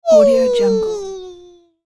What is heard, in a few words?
tiny voice "weee"